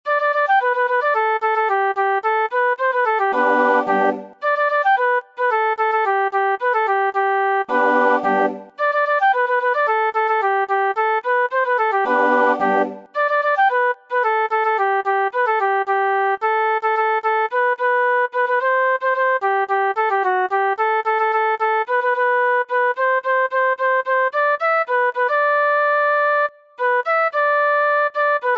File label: Flute